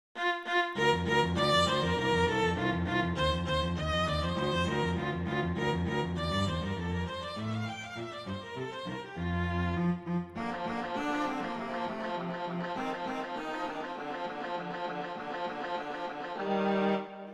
Rondo, Allegretto; Mazas; Duets; Duet 8

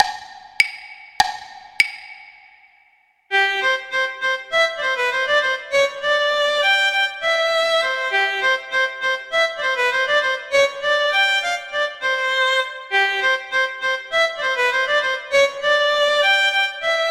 Andantino Guitar; Paganini; Sonatas Op1 Vl Gu

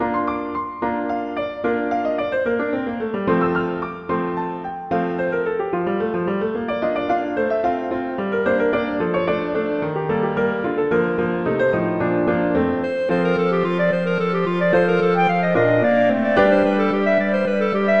Allegro; Molter

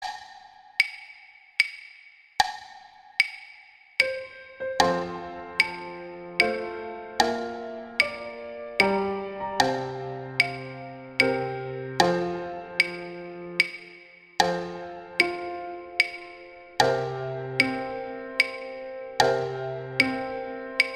Happy Birthday acc, Sax

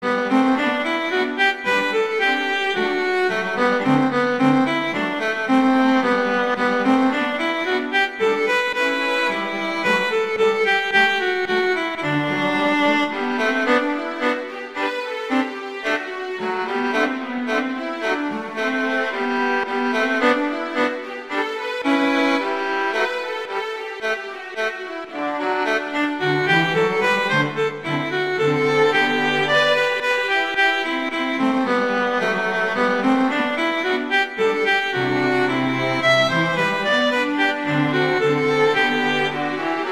Duet, Andantino grazioso; Mazas; Duets

